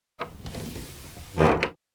finestra Open Close; Inquisitor 2

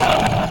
Gemito Uomo Pesce

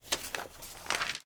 Scrivania; BLIND QUEST - The Enchanted Castle

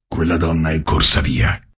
donna corsa via; Inquisitor 2

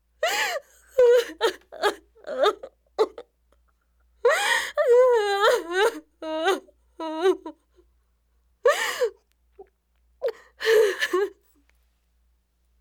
Pianto donna; Inquisitor 3

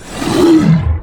Drago Di Cristallo; BLIND QUEST - The Enchanted Castle

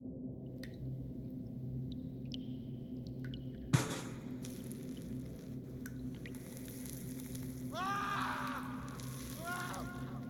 AMB Prigione; BLIND QUEST - The Enchanted Castle

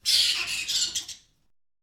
pipistrello; Inquisitor 3

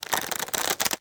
Recinto di Liane; BLIND QUEST - The Enchanted Castle

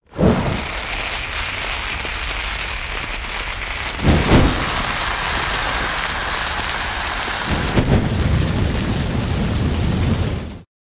propagazione incendio; Inquisitor 2

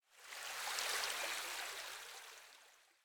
Lago; BLIND QUEST - The Enchanted Castle

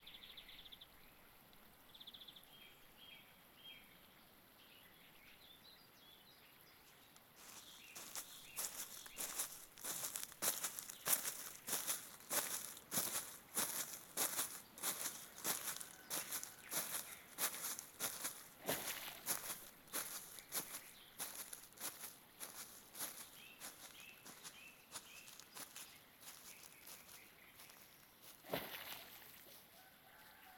AMB Vertia; BLIND QUEST - The Enchanted Castle

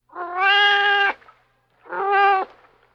gatto; Inquisitor 3

